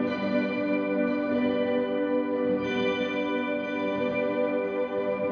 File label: Lap Clean FX 90 G, guitar drone